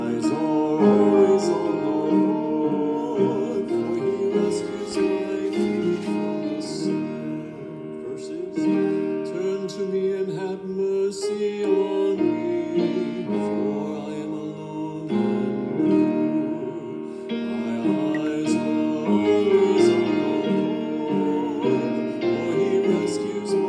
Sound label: Entrance Antiphon